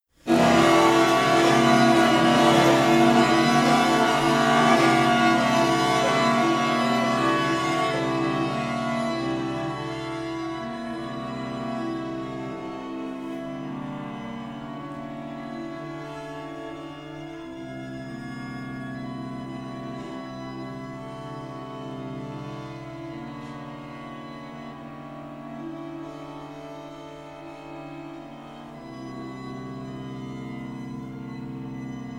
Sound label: bells; Sonar